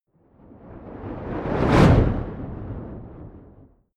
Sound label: fire whoosh big